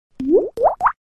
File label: bubble notification